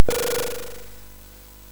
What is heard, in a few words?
SFX, MOS6581; Narco Police; MOS6581R4; hvsc